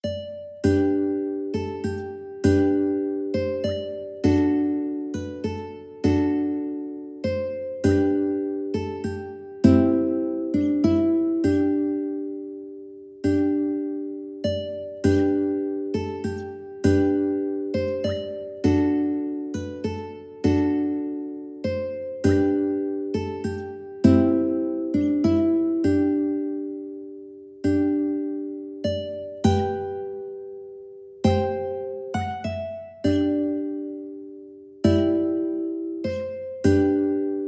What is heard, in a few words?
south wind, ukulele